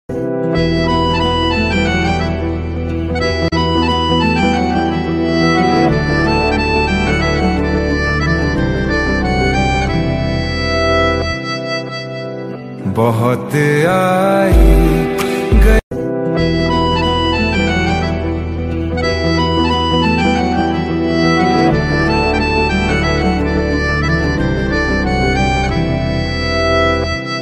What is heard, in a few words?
Instrumental Music Ringtone